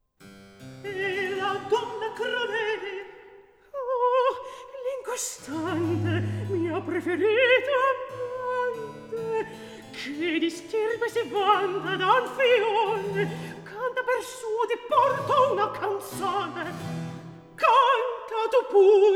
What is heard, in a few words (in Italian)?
Recitativo; Scena 5; Angelica, Orlando, Alcina, Ruggiero, Bradamante; Antonio Vivaldi (1678 - 1741); Vivaldi - Orlando furioso (2004); Atto III